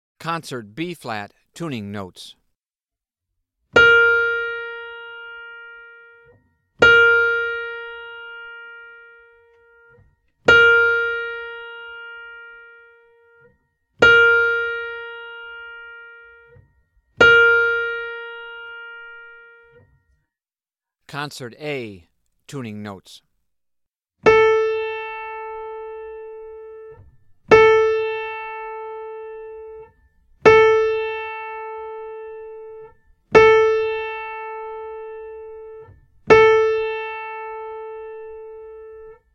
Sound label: Tuning Notes; James „Jamey“ Aebersold Play-Alongs